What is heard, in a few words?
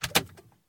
id insert; Machines